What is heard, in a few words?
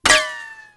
w hit; Team Alligator